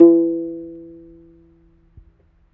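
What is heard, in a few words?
African HARP E 4; AFR. HARP1; Heart of Africa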